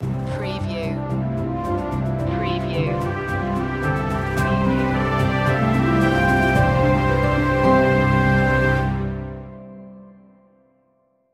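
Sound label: Loop Orchestra Stinger; 11 sec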